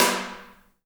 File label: HAIRCUTS, KENYA DRM, Heart of Africa; Spectrasonics